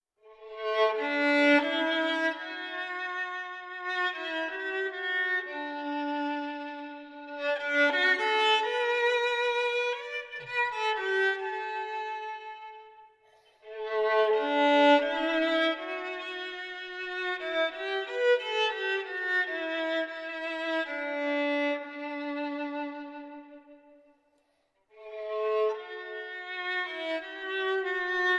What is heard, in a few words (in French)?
violon intérieur